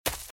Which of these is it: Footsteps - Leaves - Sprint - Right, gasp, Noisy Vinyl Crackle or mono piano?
Footsteps - Leaves - Sprint - Right